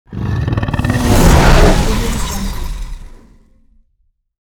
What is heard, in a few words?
dragon fire